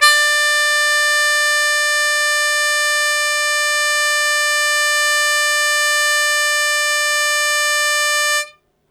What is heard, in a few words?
Loud Attack Sustain D2, Harmonica; Zero G - Harmonica